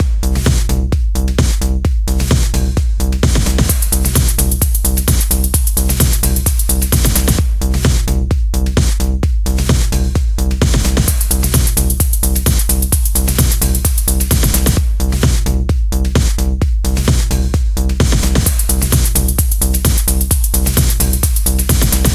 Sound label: Trance